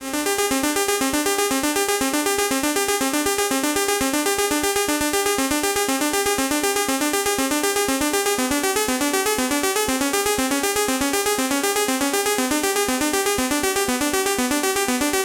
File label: MIDI microtuned arpeggiator; ChatDSP